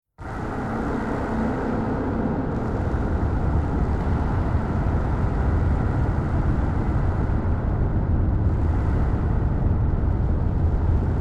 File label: Drone Scifi Eerie Dark Scary Warehouse Rumble Static; Distortion; Tonal; Scary Soundscape; Horror